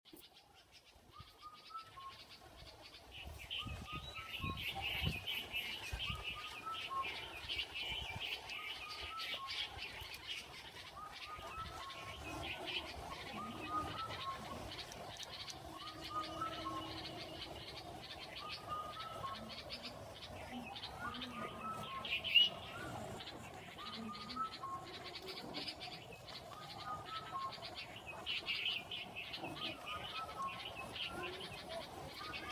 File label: birds